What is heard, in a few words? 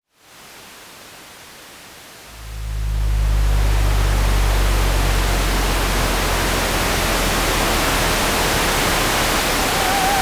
amb station platform